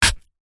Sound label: food apple stab with knife; zapsplat